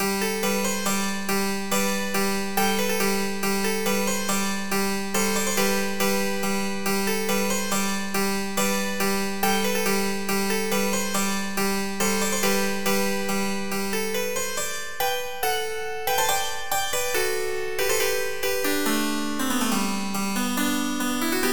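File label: harpsichord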